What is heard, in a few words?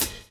DRUM CHOP; AMG Gota Yashiki Groove Activator